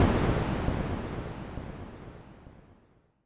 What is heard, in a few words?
hi exp; armora